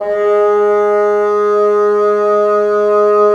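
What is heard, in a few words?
wind bassoon; LA Composer vol. 3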